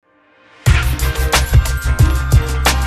Ragga

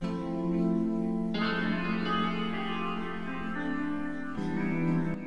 solo

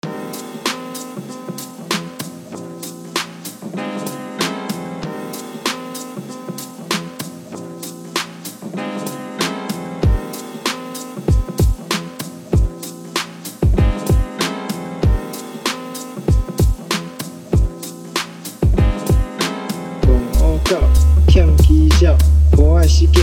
fast music

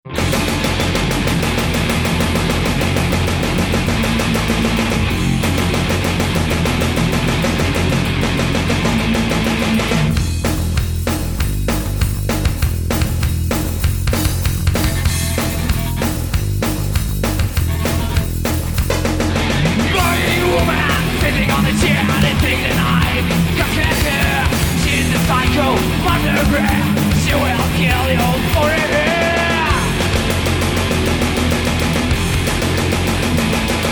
Crying Woman

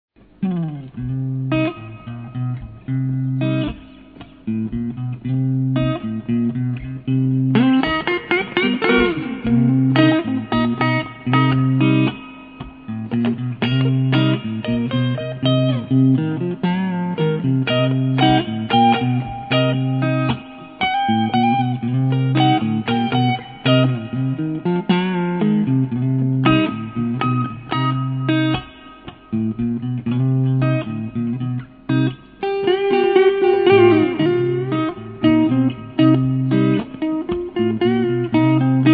blues

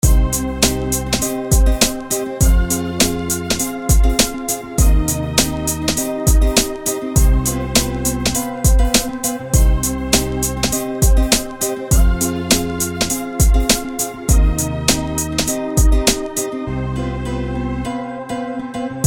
Instrumental

